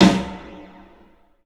PA DEEP R, CLUB SN VRB; 3D Sound; 3D Drums

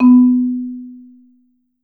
SOFT B2, Marimba; Sampleheads; Dave Samuels Marimba & Vibes

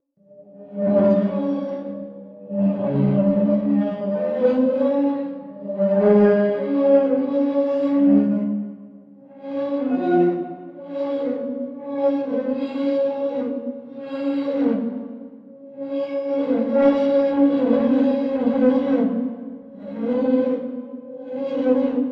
viola

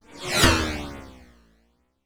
RUBBERBAND; ILIO; Trance Fusion

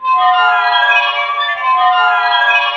WATERPHON C5; Big Fish Audio; Synth City

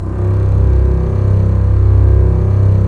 STR, Roland LA Composer; Roland - LA Composer vol. 4; Full Mutes

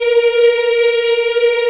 A#3 CHILD, Choir; CHILD IHF

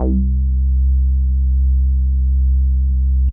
Synth Prophet Bass C; Electronisounds

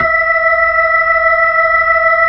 B-3, Brite

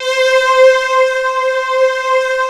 Juno C4, Roland, Analog; USB Soundscan vol.49; Analog Fantasies; AKAI

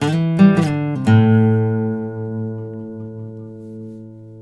BARI LICK, Blues Guitar; ILIO; Fretworks; 108 BARI LICK

